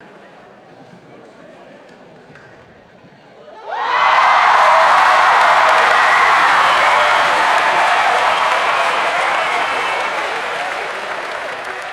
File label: crowd cheering and applauding at sporting event